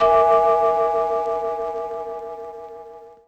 cartoon bell; Greytsounds; CARTOON FX

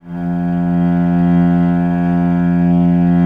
VL F1, VIOLE, Historical Instruments; USB Soundscan vol.23

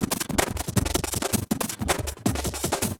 Beat, Industrial

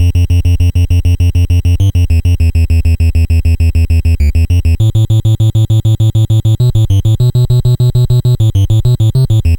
NES Bass, chiptune

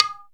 percussion, Ethnic Perc

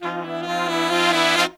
132, Pop & Funk Brass

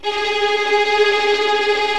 TREM VIOLINS

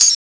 hi-percussion, techno; Techno ID; single-drums

